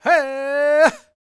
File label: POWWOW, LAKOTA, World Voices; ILIO; Vocal Planet VOL-5